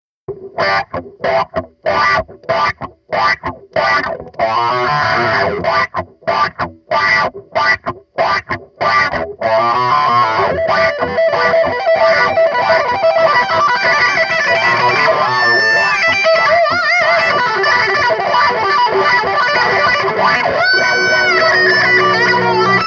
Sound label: lead